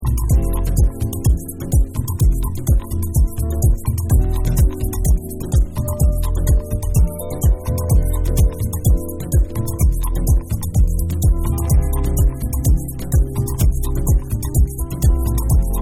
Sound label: Instrumental Mix